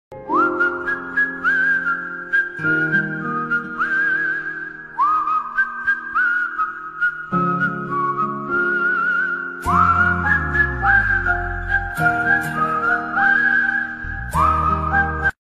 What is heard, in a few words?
instrumental ringtone whistle ringtone; fanaa